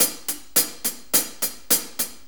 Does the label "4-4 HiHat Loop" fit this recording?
Yes